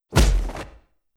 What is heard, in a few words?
hit; Leenkx Examples